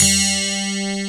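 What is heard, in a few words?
ACOUSTIC GUITAR; Pro-Rec - Synthsonic; BASSES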